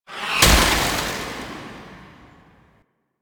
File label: Block; The War Within; Mountain Pass; Teshin